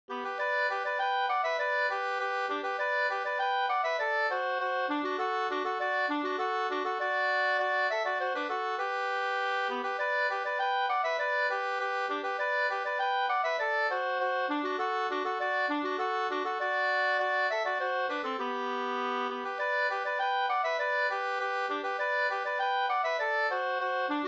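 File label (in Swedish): snoa, two-part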